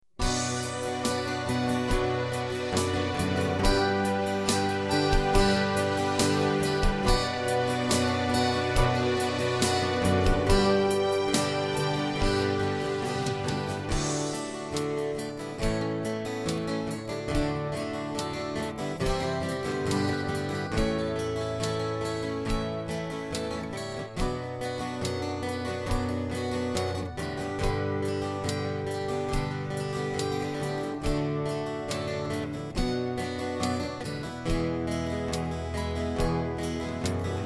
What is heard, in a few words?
D Backing